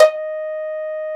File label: Pizz Violin E3